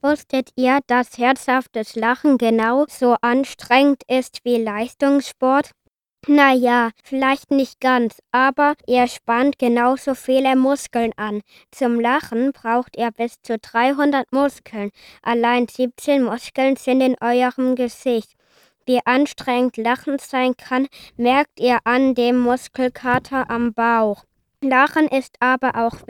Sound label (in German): Lachen